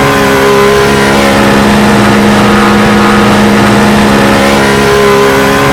in PHIGH; Mercedes; AOS F1 2022

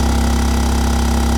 KART EX ON VERY LOW, kart

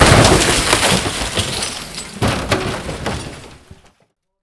impact wall heavy; Kart; AOS RF1 Kart Demo

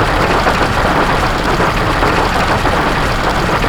gravel roll; AOS F1 2022

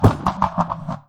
rumble long ex, Kart

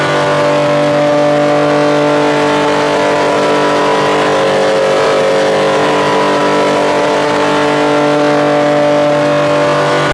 on high ex, kart

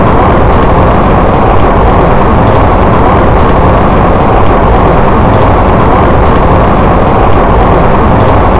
gravel skid in, Tires; Kart; AOS RF1 Kart Demo

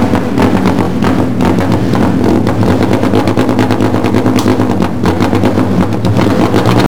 idle in, kart

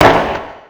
backfire ext, kart

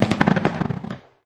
rumble short ex, Kart

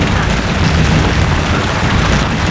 grass roll int wet, Kart Tires; AOS RF1 Kart Demo

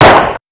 pop, Kart

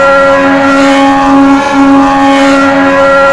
EX ON HIGH, F1; AOS F1 2022; FSR2018

